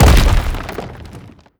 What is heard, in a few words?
bash hard, brickwall, destruction